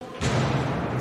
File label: door slam echo